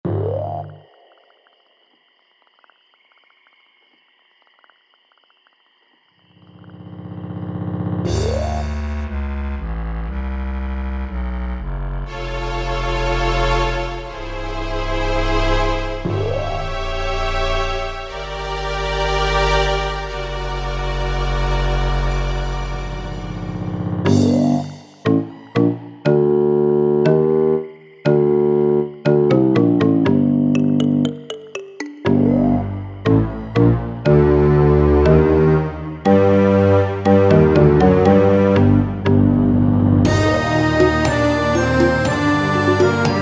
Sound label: mus swamp; Archvale